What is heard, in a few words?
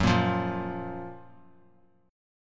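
G flat Major 7th G flat; GbM7 strummed; JGuitar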